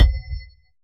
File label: impact Mining; Kenney's Sound Pack; Impact Sounds